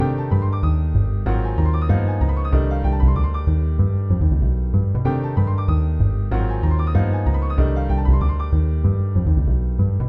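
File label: voicings with bass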